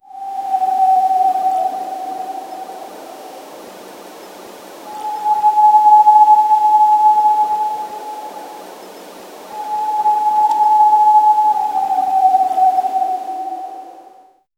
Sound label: a calm forest ambiance wi, crows